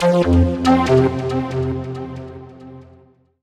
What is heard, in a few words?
TECHNO SYNTH 140; Keys